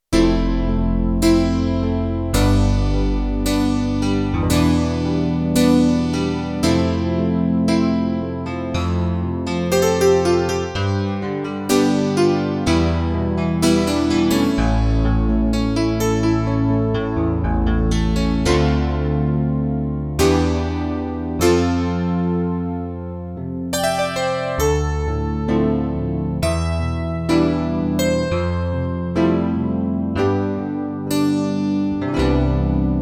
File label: LA MIDI'ed Piano, Roland JD-800; Factory Patches